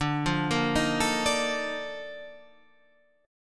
Bb Major 11th D

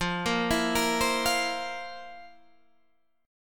F Major 7th Suspended 4th Guitar Half Step Down

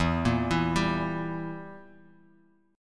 E Diminished E down, guitar; Edim arpeggiated; JGuitar

